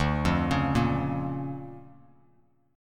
D Minor Major 7th Sharp 5th, D, Bass Standard; DmM7#5 arpeggiated; JGuitar

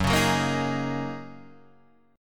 Gb 6th Add 9th Gb down Guitar Open D

